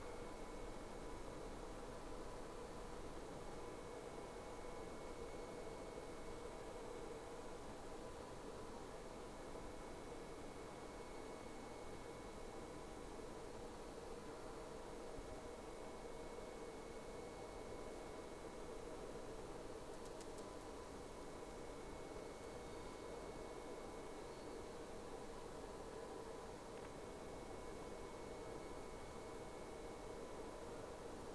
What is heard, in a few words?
Whales; SeaSnake